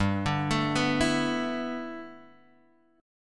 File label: G 6th Add 9th, Guitar, Open G